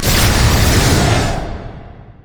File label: dash; LoL; Nocturne; paranoia dash